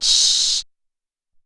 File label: Noiz Hat, Alesis SR-18